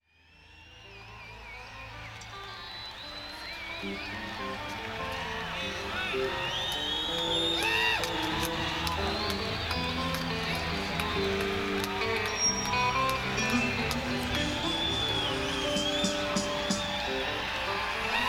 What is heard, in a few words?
Crowd; senn421